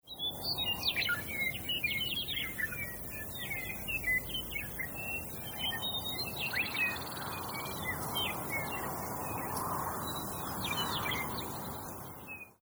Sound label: Alarm